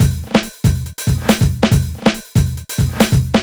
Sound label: Breakbeat 140 BPM